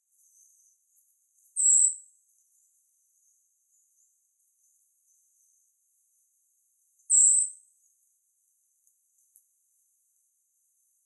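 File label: eyebrowed thrush, utsunomiya; mamichajinai; koe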